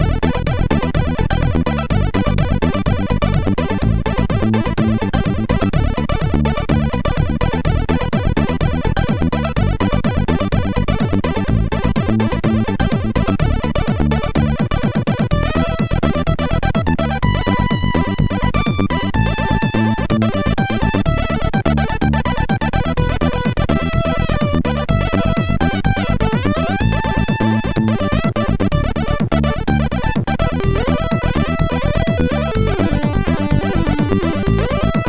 Naughty, sid MOS6581R4

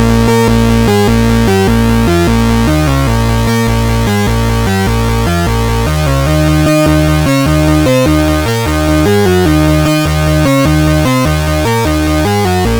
Introtune; CSG8580R5; sid; hvsc

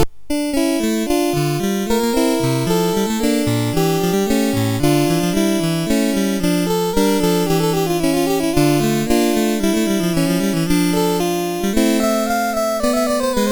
Fantasie, CSG8580R5

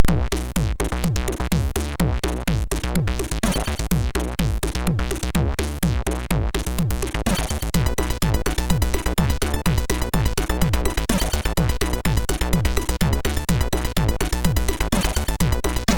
Aciddd, MOS6581R3

